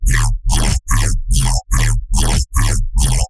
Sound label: bass loop sweeper Dm 145